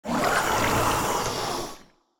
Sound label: sea monster roar